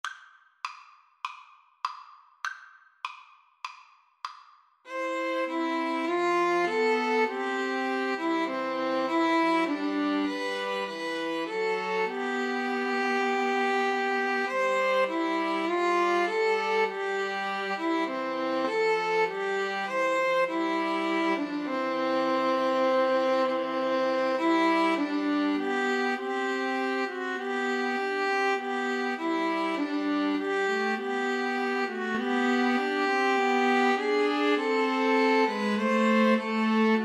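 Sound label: string trio karaoke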